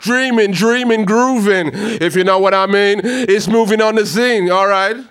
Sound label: HAL RAGGAM, RAGGA-MUFF A maj